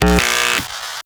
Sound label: Screen Static; Cephalon Tweacher; Warframe; The New War